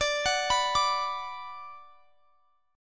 D 6th D down, chord; D6 arpeggiated

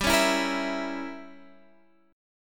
G Diminished 7th, Guitar, Standard; strummed; JGuitar

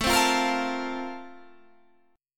A sharp Major 7th Suspended 2nd A

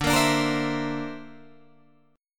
D 7th Suspended 2nd Sharp 5th, Guitar Drop D

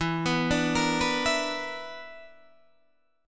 B Minor Major 7th Double Flat 5th, chord

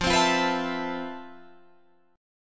F Major Sharp 11th, Guitar, Drop D

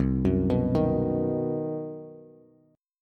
C Minor Major 7th Flat 5th C down Bass; arpeggiated; JGuitar

